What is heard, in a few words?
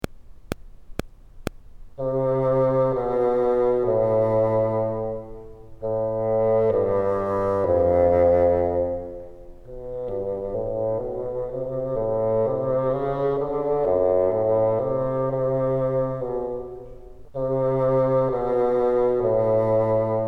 bottom, bassoon